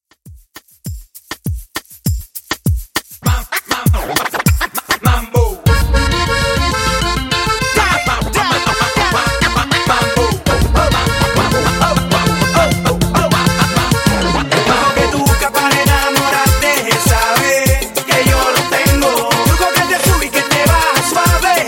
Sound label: Reggaeton